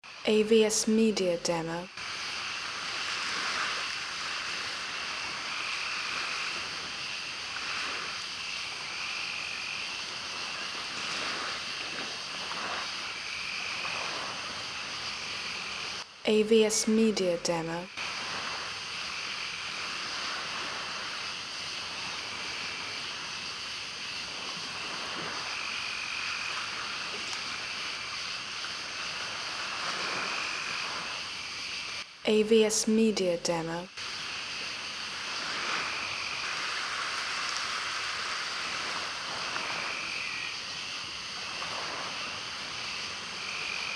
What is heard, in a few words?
Coastal Zone